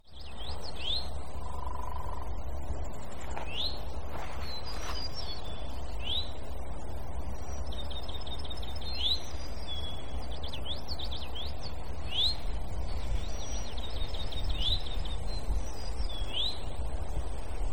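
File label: Eastern Towhee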